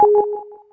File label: menu prev